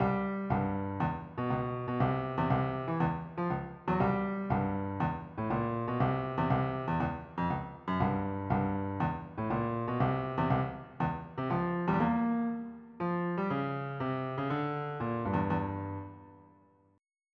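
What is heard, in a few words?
shuffle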